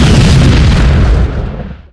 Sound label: explosions; hl2; impact